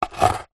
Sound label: office pen biro draw single line on thin wood